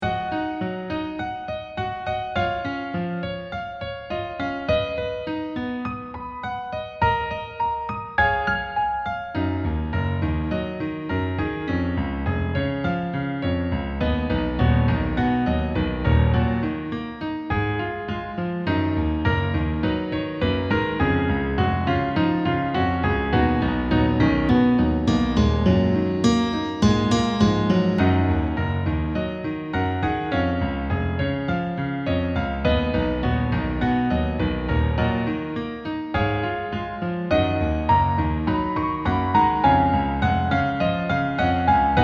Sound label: destiny formation piano version